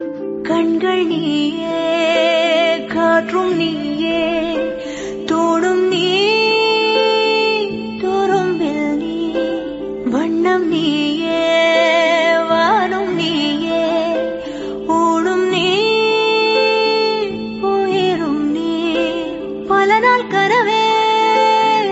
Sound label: female tamil